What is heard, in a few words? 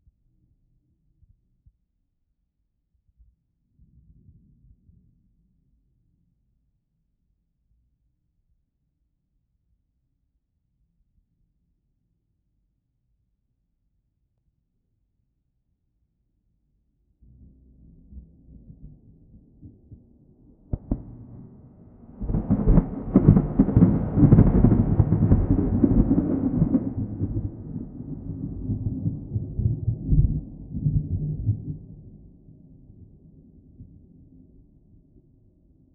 thunder in an open field